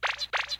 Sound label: boing boing